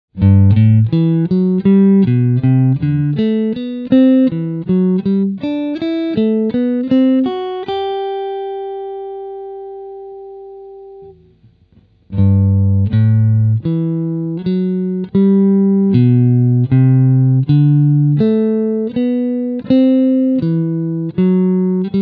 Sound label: String Skipping, guitar